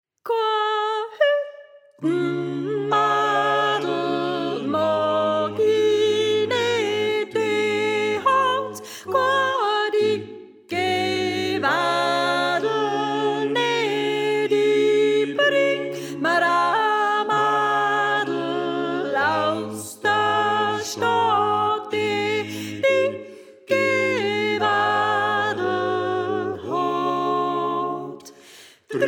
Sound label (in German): Sopran